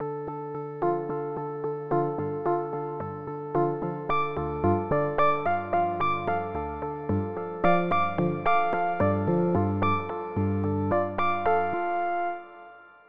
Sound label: random notes; sandpile; bullseye